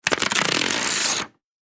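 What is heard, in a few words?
adhesive tape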